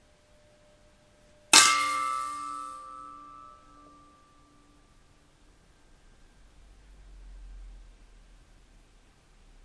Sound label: chiming bin throwing away cds enjoying the sound they make